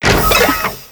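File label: Npc Steam Walker Damage; FlyForInsanity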